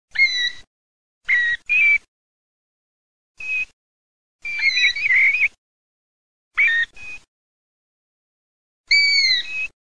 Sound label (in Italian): PIVIERE